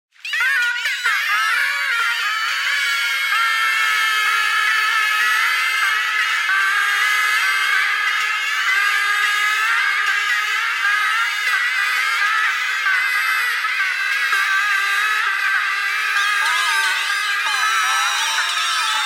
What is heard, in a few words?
bagpipe geese thread noise